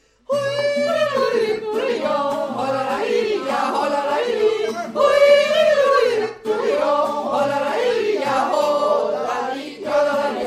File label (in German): Appenzeller Jodler